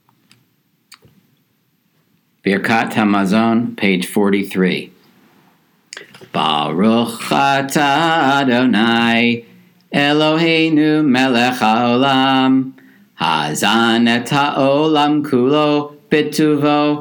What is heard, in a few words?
Birkat Hamazon; Passover Seder